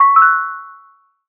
pluck C#m; Melodies; One Shots; stars; Slate; AURORA Sample Pack

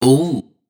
adlib "Ooo" chop, wet vocals; Slate Digital Omnivox Vocal Sample Pack; Adlibs

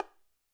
bongos Single Hit Mute; raw perc one shots; SEISMIC Drum Sample Pack; Slate

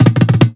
tom jangle; Drums; One Shots; Slate; AURORA Sample Pack; Toms

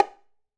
bongos Single Hit Left Hand; raw perc one shots; SEISMIC Drum Sample Pack; Slate

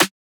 snare oneshot, Pop Sample Pack; Slate; SD PASADENA Pop Sample Pack

